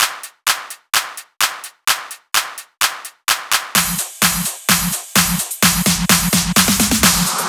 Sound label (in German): Drum Loop 128BPM Full ohne BD-Bass